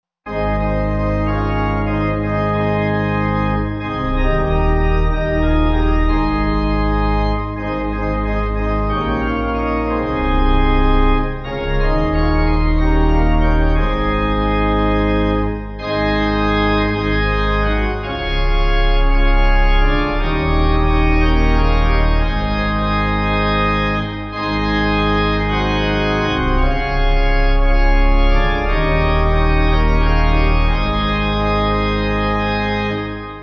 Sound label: Why Do You Wait, Pipe; G F Root